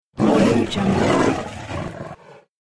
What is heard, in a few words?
tiger roar